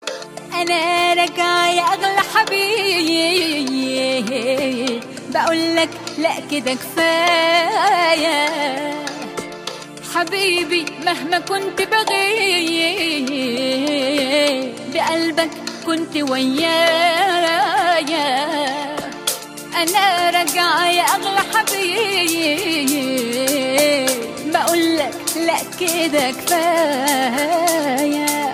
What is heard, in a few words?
arabic best